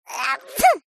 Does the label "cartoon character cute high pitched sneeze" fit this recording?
Yes